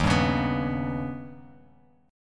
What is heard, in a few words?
Eb Augmented 7th, Bass Standard; Eb+7 strummed; 11,10,11,16; JGuitar